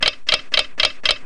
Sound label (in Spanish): reloj